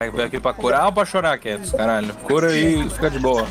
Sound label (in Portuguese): chorao